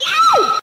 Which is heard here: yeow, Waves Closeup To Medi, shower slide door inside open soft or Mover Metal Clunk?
yeow